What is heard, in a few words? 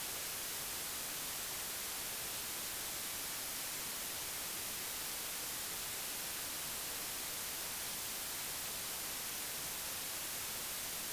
noise sample